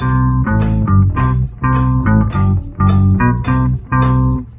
guitar, Loops, Phonky

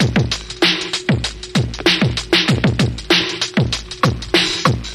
disturb, Drum loops Electro

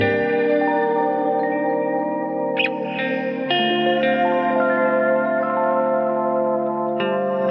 guitar and vibes, Loops, Phonky

